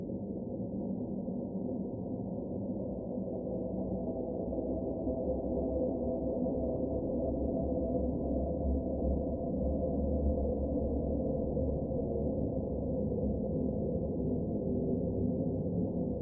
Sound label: Atmosphere Drone Dark Loop Stereo